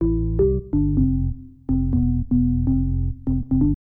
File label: ORGAN HOUSE 125 A; eJay; Keys